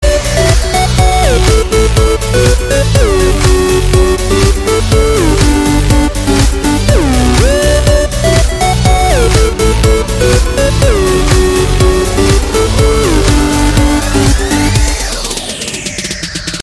race electronics

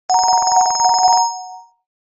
telegraph; nokia

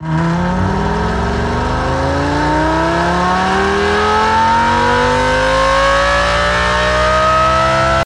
Lamborghini Gallardo

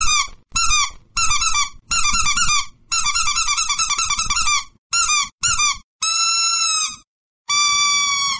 iphone duck; iphone 12 pro

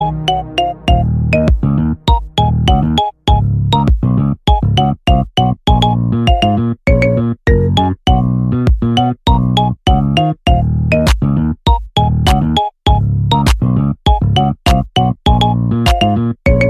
Samsung Galaxy Note 10

